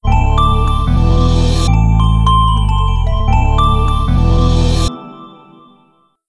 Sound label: Shutdown; Windows Never Released Soundpack 1